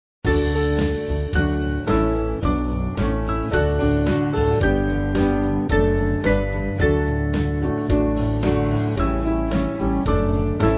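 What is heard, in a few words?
Band, Free Music Backing Files for Churches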